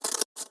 cut paper

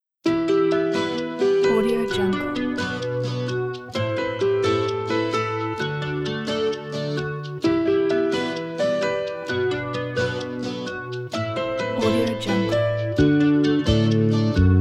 romantic walk; studiobell

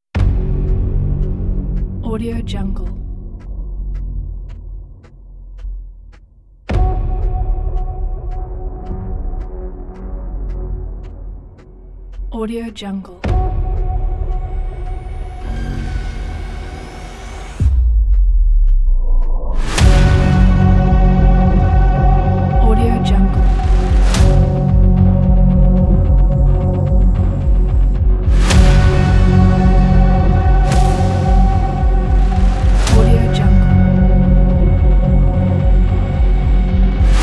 hybrid cinematic teaser

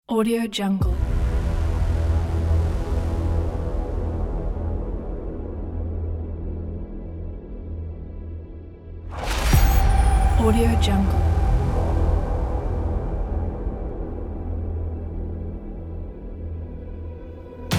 epic massive hybrid action trailer; katcamusic

